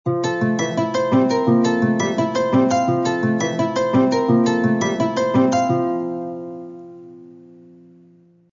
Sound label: kora